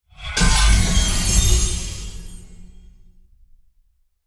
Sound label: Active Alchemy